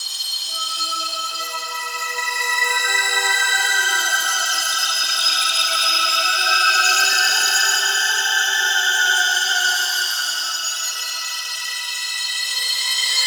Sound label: Metal Lotus Flower Loop; Props; Warframe; Tau; Ambience